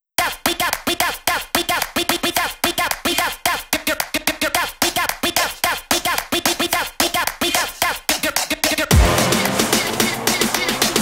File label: Routine Set music